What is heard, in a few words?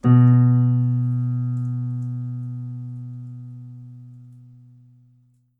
piano sample